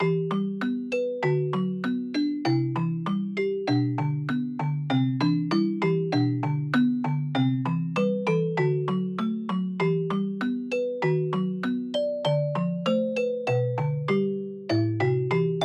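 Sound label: Ballad, MIDI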